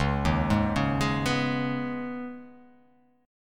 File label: Db 7th Suspended 2nd; JGuitar